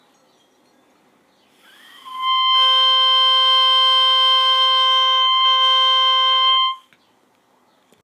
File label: Adjusted Legere Reed Crow